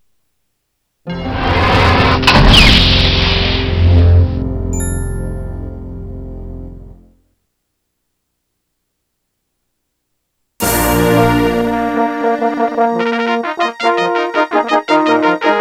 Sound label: Orchid NuSound PnP 32, General MIDI; Pentium 200, General MIDI/SB Pro; Star Wars: TIE Fighter; LucasArts (1994)